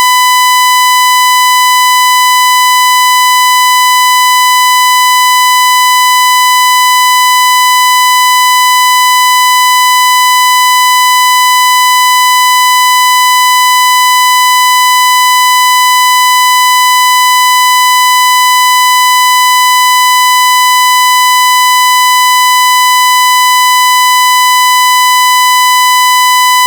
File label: sawtooth 1000Hz